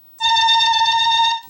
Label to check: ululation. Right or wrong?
Right